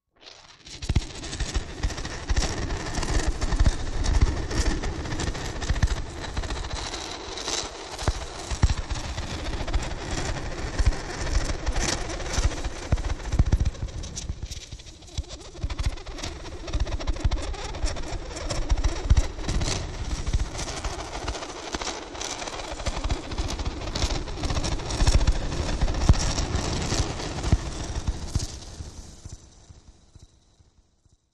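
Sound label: Rubber Horses White Noise, static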